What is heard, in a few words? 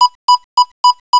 checkpoint; Outrun; Arcade